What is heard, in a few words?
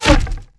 catapult; Crazy Machines; Играет вся семья